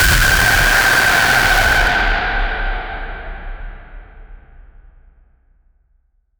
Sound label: horror jumpscare ghost, apparition